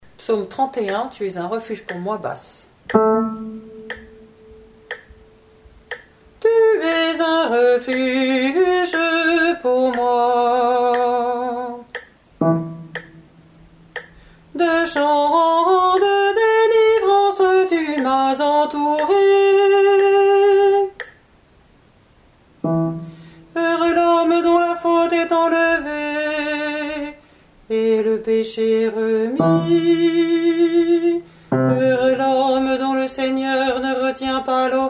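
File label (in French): Psaume Tu es un refuge pour moi Basse; Gouzes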